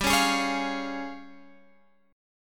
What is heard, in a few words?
Db Major G; strummed